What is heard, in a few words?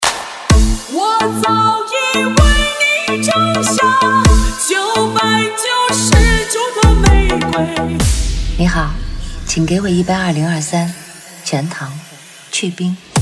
remix tiktok